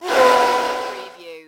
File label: CREATURE SCREAM WHOOSH